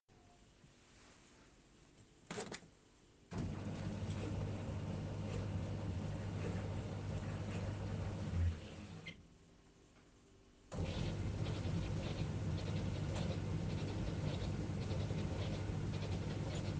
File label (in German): Trockner